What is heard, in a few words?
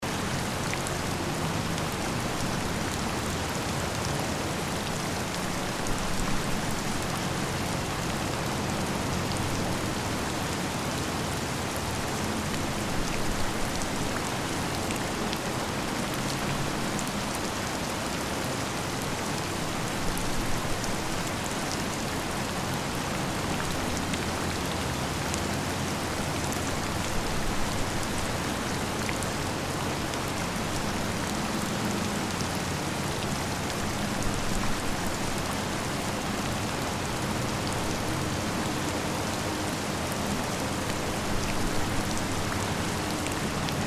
Heavy rainstorm ambience city loop